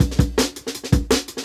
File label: Jungle Breaks